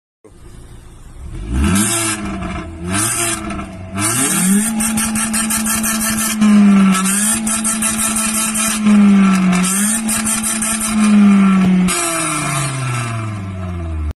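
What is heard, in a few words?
Turbo, exhaust; سورن; Suren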